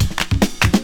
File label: disco dance, funky drum loops